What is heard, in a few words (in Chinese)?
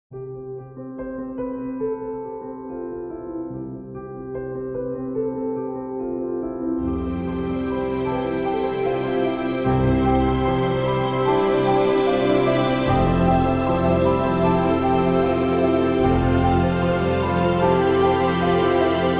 专题片背景音乐